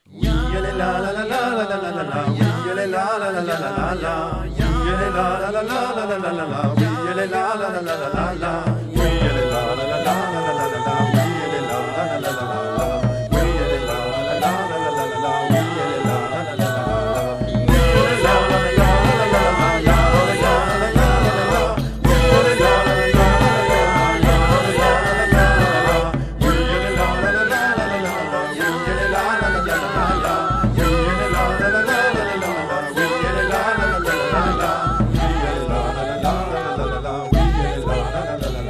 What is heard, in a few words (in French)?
voix biere